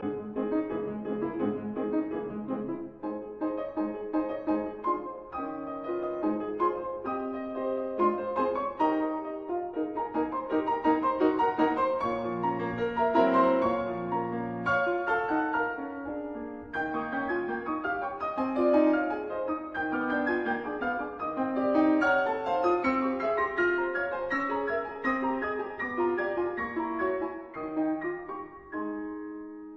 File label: Ballet music; Schubert; from Rosamunde